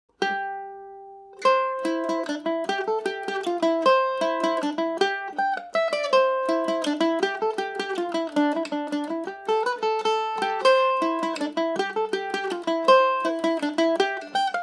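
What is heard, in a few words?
C major, irish mandolin; money in both pockets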